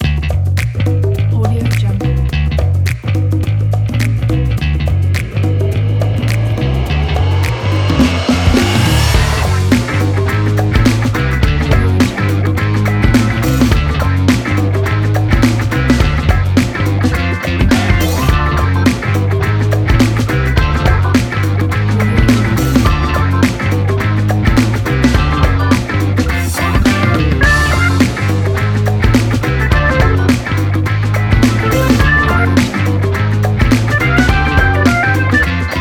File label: garage angry funk